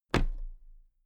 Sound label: toolbox place upright regular